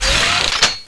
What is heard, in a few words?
launcher on, weapons